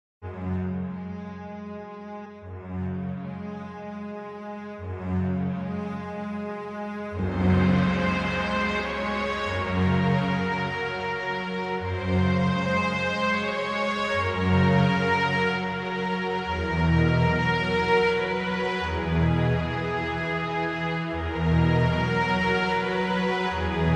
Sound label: sad, bgm